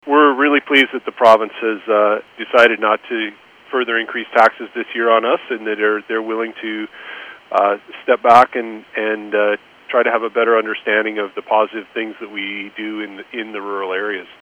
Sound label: Tom